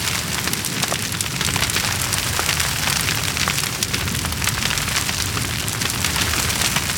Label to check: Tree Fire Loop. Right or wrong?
Right